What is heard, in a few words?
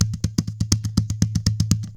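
kalabass groove; Best Service; World Colours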